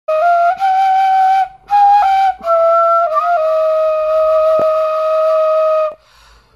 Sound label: Kaval; کاوال